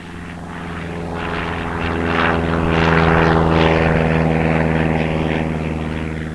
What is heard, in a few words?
airplane snd